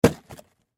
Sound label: Cardboard Box Drop; Materials; Blastwave; Foley